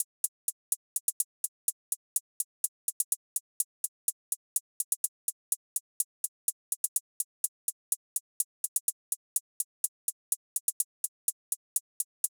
Drum Loop - 125 BPM Hihat; Cymatics; Antidote Drum Loop

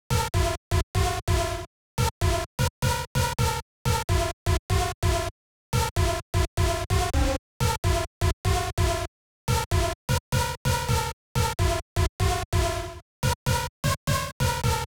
128 BPM D# Min Lead; House; Drop Loops; Loop Stems; Cymatics

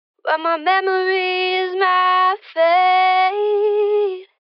Phrase Dry 79 BPM C# Min, Vocals; Memories Fade; Cymatics - Eternity Sample Pack; Phrases

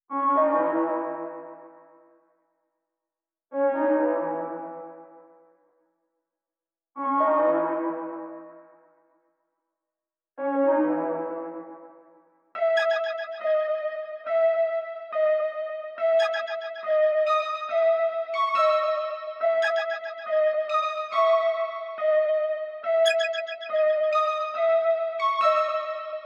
140 BPM C# Min Lead, loop stems; Cymatics; 2022 Melody Collection Platinum Expansion - Pt 1; Madness

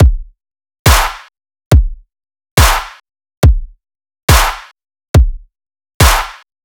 Kick & Clap 140BPM, dubstep; Loops; Drums; Ghosthack; Ghosthack - AC2024 - Day 13 - Dubstep Sounds 2025

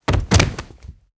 Heavy Body Fall Tile Floor; Fairlight Sound Lib

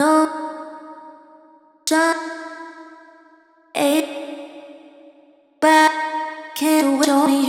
Vocal Loop C#m 128BPM; Vocals; GhostHack; Ghosthack - Advent Calendar 2022 - Day 12 - Vocal Loops

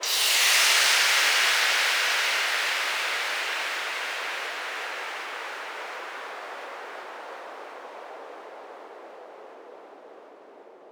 Sweeper White Noise Down; House; FX

